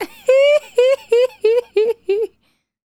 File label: Female Laugh, Hip-Hop and RnB Vocals